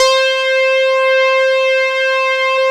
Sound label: 700 DUET C 3, Korg; Back In Time Records; Korg Universe VOL-1